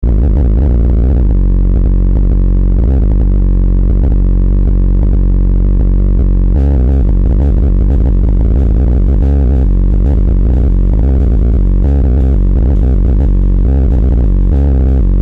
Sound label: Bassi